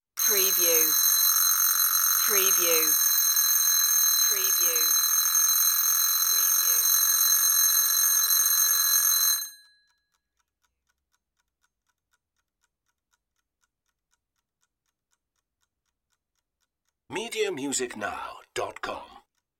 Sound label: alarm clock ring